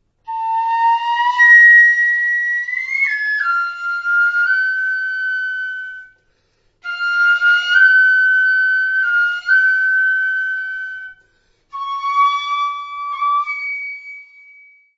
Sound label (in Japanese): 龍笛